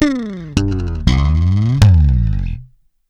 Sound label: FUN FILLED, Bass, 80 BPM; Sampleheads; Ultra Freakin' Bass